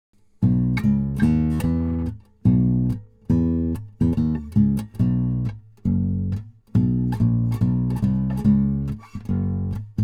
GB72CE Wechselbass Country Mic Pickup

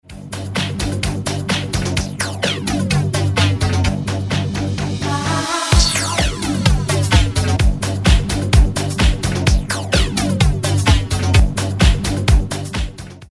DJ Flanger Effekt; Hercules

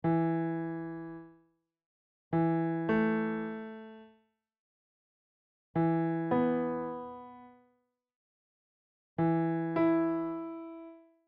reine Intervalle

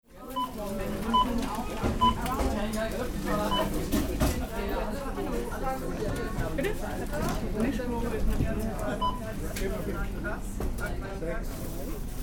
Hessisch Supermarkt Atmo

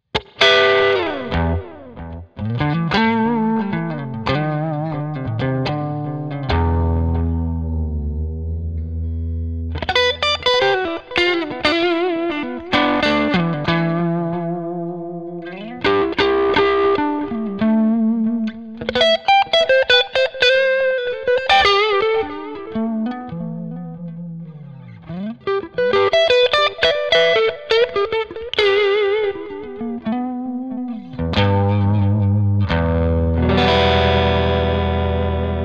Gitarren Plug-ins im Vergleich Logic Amp Designer Breakup

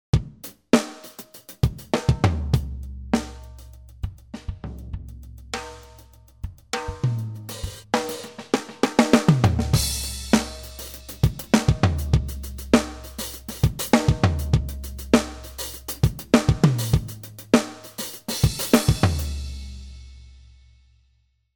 Master Dynamik Automation

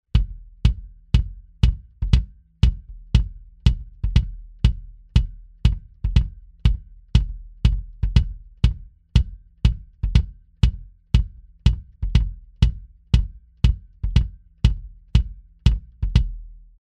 V Kick Mitte Classic; sE Electronics

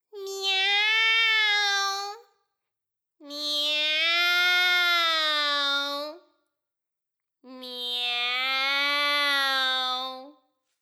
miauu

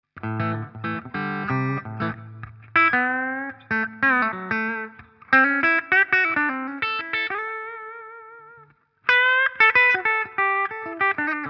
Gibson Les Paul Special Clean Steg

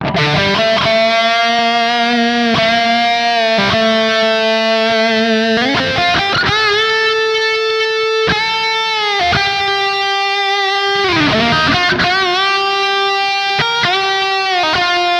Floorboards im Vergleich Lead Kemper

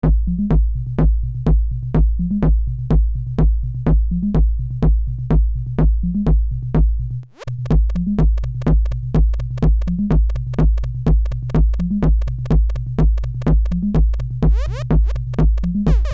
Chandler Limited RS124 Mix Bus Attack 9 Recovery 1 200 Ohm max. Reduktion ca. 2 – 3 dB; SuperFuse Mod

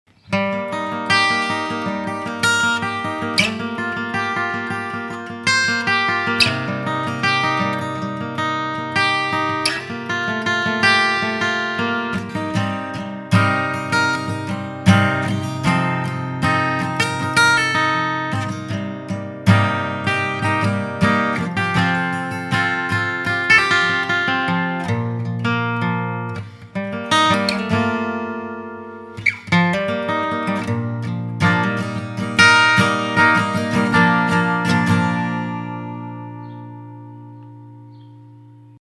XS Saiten Mikro Picking; DAddario

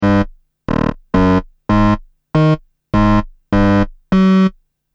Anschlagsdynamik

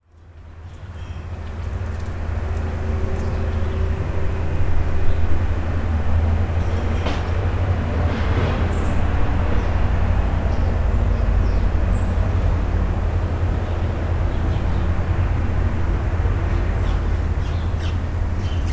Atmo; Mackie EM Carbon

